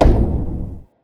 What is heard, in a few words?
perc stomp, unmixed; drumkits; pierre stomp, unmixed; fl studio; xander brown drumkit